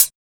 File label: Closed Hats